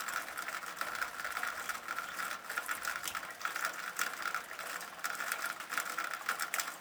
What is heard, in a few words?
Rain Gutter; zps; Ambient